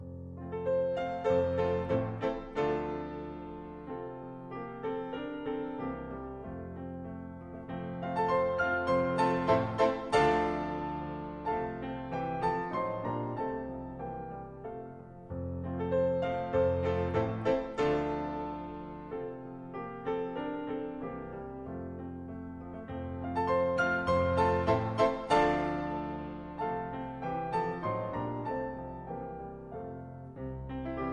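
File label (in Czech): Slovanský tanec F dur Tempo di Minuetto (Sousedská), varianta pro čtyřruční klavír; č.4; 1878; Antonín Dvořák; op. 46; Peter Toperczer, Marián Lapšanský - klavír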